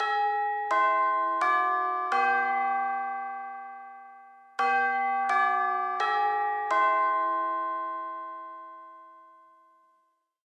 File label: chime; misao